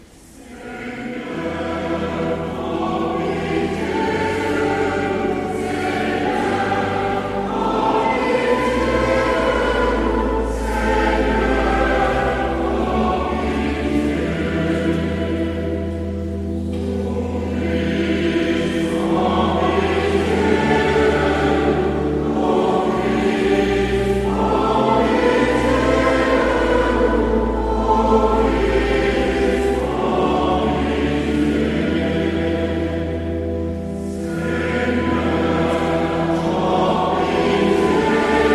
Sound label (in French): Kyrie messe de la Trinite Tutti